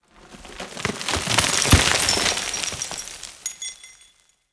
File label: junk debris, ambient